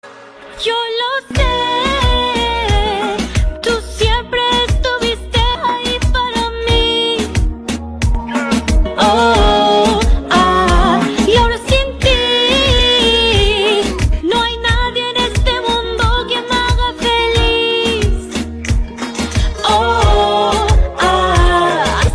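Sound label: Spanish Ringtone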